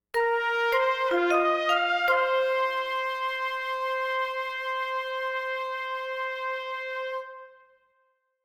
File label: Trumpet, Flute, Oboe Vibes stringer; Short